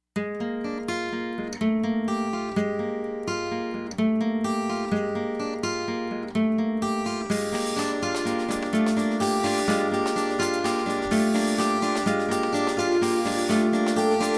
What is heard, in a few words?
Pipe Test